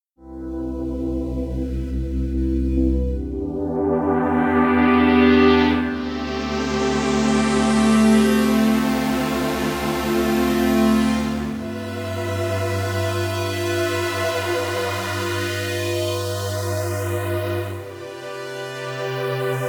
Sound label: SEM Pad Filter Sweep; Arturia